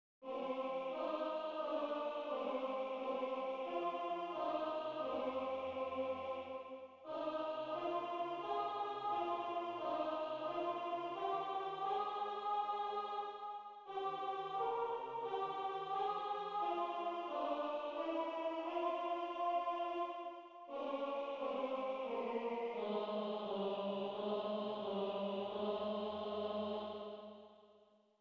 Harmonisation a 4 voix; Veni redemptor gentium

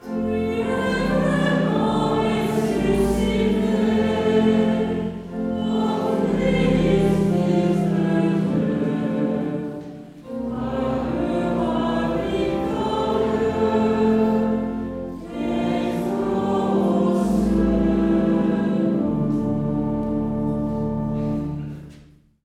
Priere universelle de Paques, chantre; Messe de Verrieres

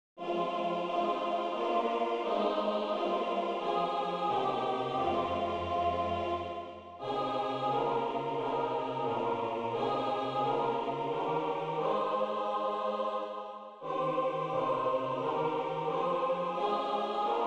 Harmonisation a 4 voix; Veni redemptor gentium

